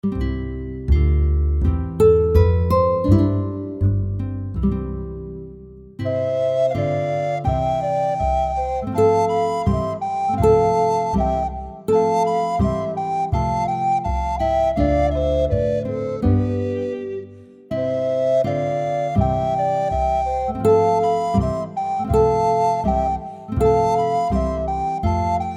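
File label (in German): Gavotte allemande, Flötentöne; 44 - Gavotte allemande; Jede Menge Floetentoene 1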